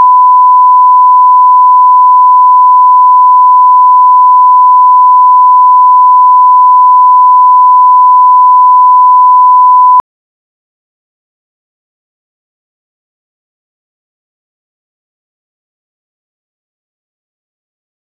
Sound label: Sweep